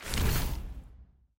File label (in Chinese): 签约合同弹出; nba; 球探系统